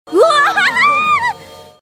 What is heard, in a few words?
wahahaha